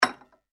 household cup placed on saucer; China Cup Placed On Saucer; Kitchen; McKinney Sound

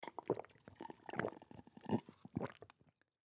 Drink Water From Glass; Drinking; Human; Blastwave

